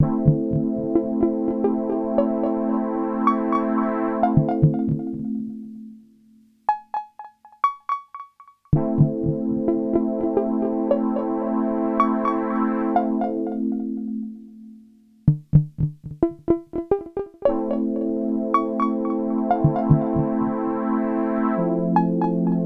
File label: blues